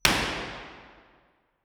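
mono, impulse response, St Patricks church Patrington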